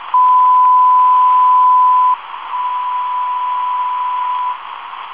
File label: haarp echo